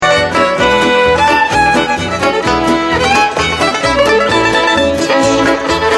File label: fourstring